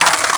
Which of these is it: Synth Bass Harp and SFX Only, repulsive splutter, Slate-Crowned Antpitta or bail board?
bail board